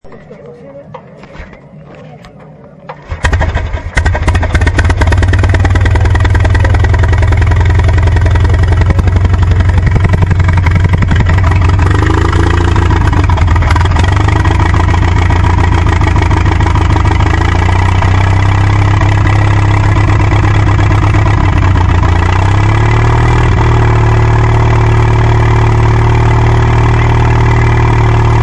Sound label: ratier L7